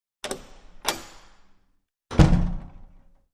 Small Wooden Door Ope

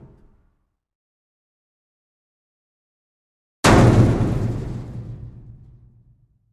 Dumpster Hit Sledge; Dumpster Type 2 Hit with Sledge Hammer

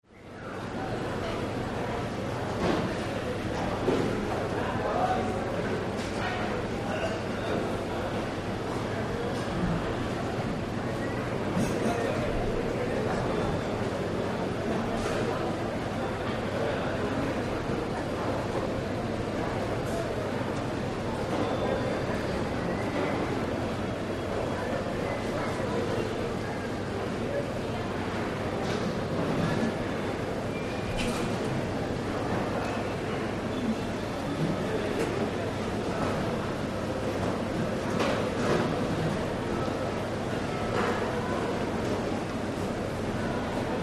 Market Amb Light Spanish

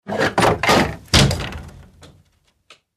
Hanging Kick Awy Chai, crashes & creaks

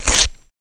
Bell Pepper Rip; gore stabs & eat

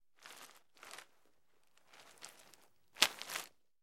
Ice Bag Movements Ice

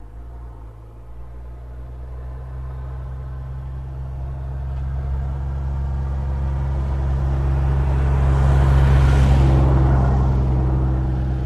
Car By On Asphalt

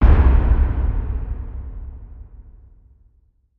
Bass Impact Low Drum; impacts

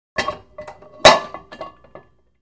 Free Weights Exerci

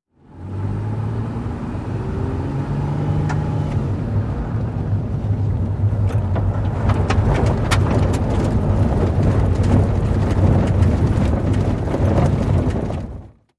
SUV Trk Pav To Gravel

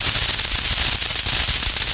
Elect Arc Sparking, arcs & sparks

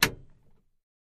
Camaro Power Unlock, chevy camaro

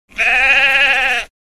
Sheep Bleat, Single. Close Perspective.

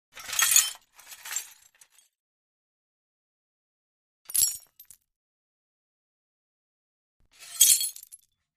Glass Moves X3

